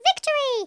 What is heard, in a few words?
victory